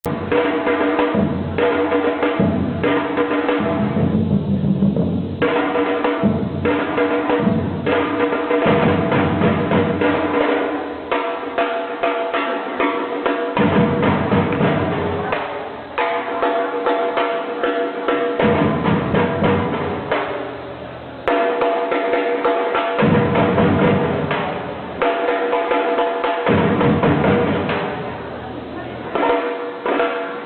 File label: Move Groove